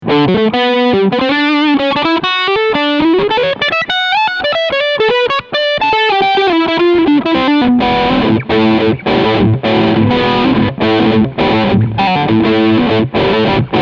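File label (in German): Music Man Sabre Crunch Sound Steg Humbucker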